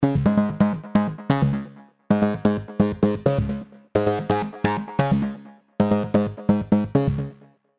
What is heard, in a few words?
Bass Guitar More Pick; Arturia KORG MS 20 V; Dist TUBE CULTURE